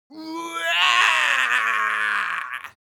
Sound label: creature imp battlecry